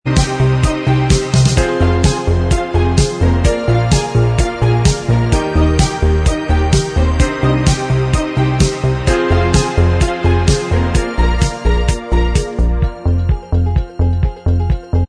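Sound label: instrumental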